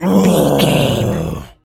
zombie voice grunt

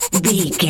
voice fun small creature sniff

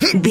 voice male effort short jump

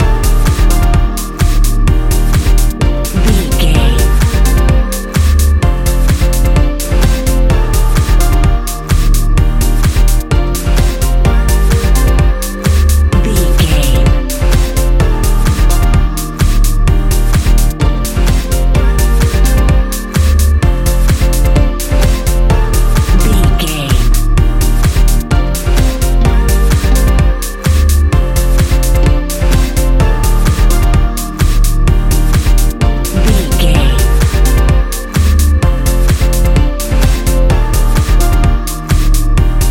vibes passion, A fast paced piece of club music; A fast paced piece of club music perfect for the nightclub, and a youth orientated visual scene.

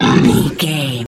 troll monster hurt pain

